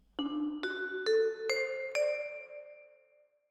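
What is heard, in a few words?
Vibraphone Close, Jazz for piano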